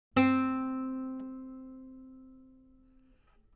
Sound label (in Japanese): 撥弦; 弦鳴楽器; 河野賢; C4